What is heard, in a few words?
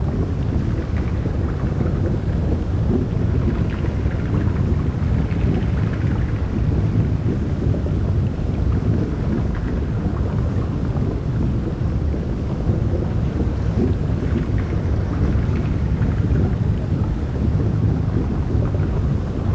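molten Cortosis loop; Star Wars Obi Wan; XBOX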